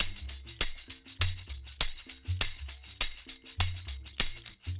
Tamborine, Percussion Loops; Ambient Grooves

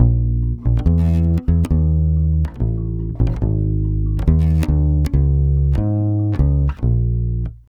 BASS GROOVE, Brazil Bossa; USB Soundscan vol.16

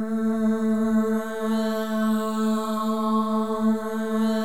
MOVING V2, Symphony of Voices; Spectrasonics

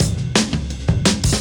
Beat Style, 170bpm, breaks

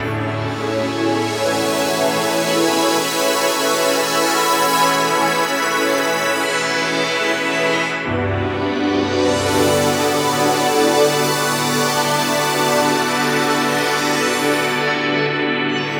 Pad Swirl, Drum & Bass Pads; Sonic Foundry (Sony Creative Software); Knutrix Drum & Bass

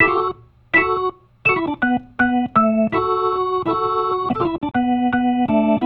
Soul Organ Riff, 70s Analog Funk

